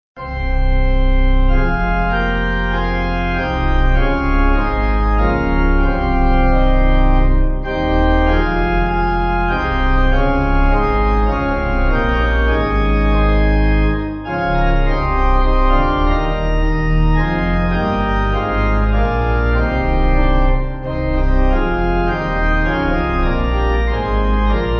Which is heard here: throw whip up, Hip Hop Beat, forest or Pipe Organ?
Pipe Organ